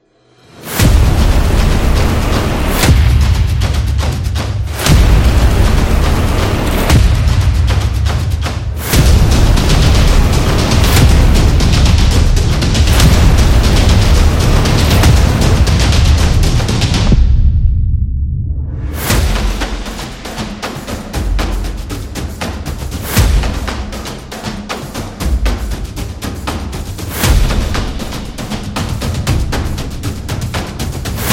Epic Drums Cinematic; battle drum

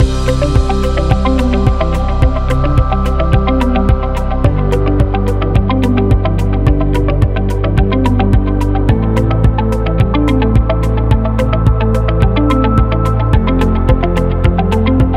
Information Loop

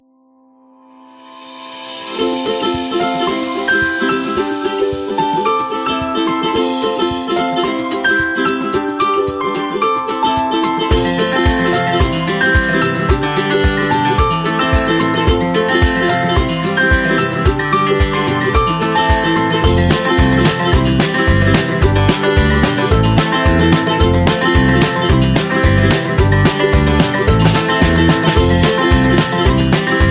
Birthday Upbeat

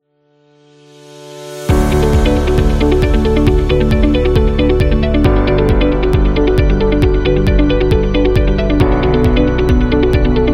Fast Corporate

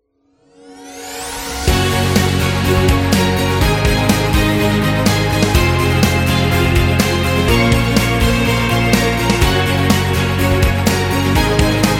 Motivation Rock; medical background

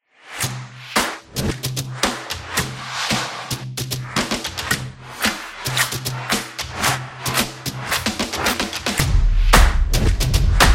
Modern Drum; sports beat

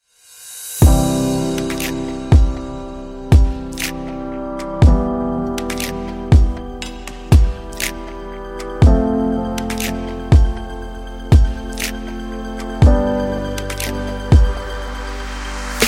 Emotional Inspiring RnB; ambient beat

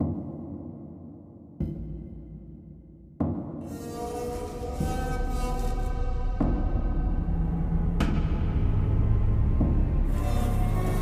Panic

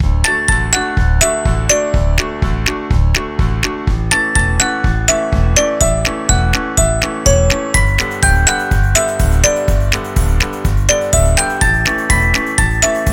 Happy Background

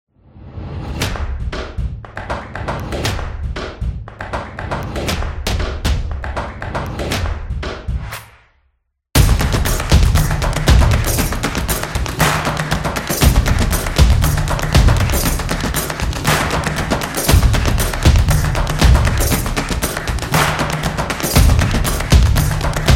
Percussion Rhythm

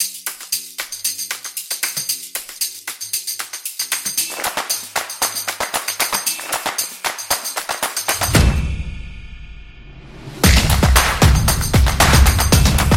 Sexy

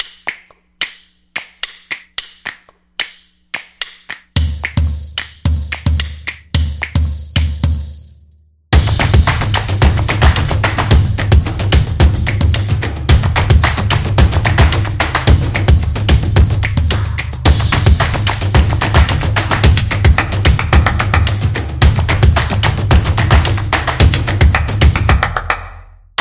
This Is Teaser Percussion; stomps action

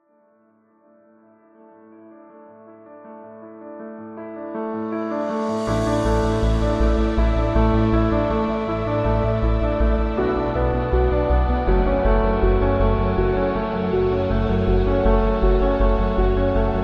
Documentary

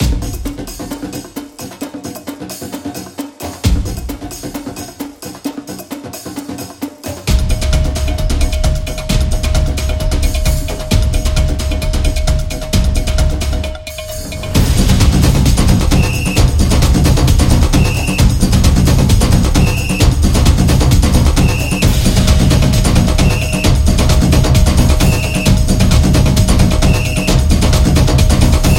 Samba Percussion

